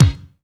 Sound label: DX MIX BD, DRUM; AKAI S6000 CD-ROM - Volume 1